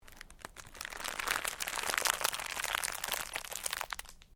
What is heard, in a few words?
Cellophane crinkle